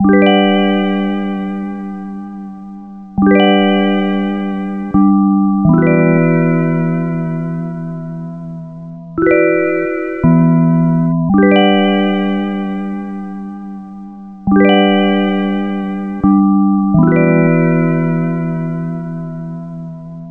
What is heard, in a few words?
85 Bpm Lofi Vibes Melody